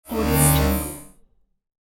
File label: sci fi scanner